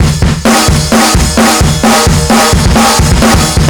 130 full, metal drum samples drums acoustic 130bpm; musicradar-metal-drum-samples